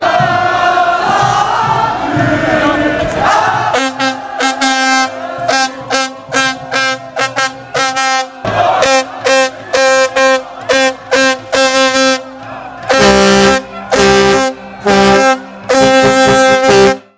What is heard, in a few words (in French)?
champs elysees paris corne de brume; thomas debesse